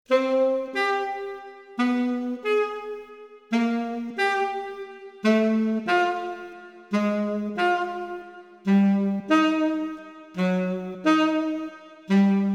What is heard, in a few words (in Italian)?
quartetto II excerpt